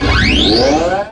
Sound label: Coolant Pres Inc